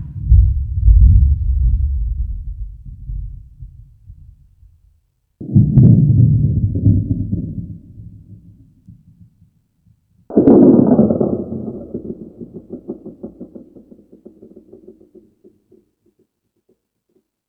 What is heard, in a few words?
Tool Kit, Drum Machines E-MU Pro-Cussion